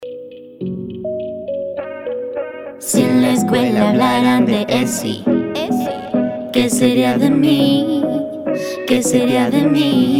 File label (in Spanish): Jingle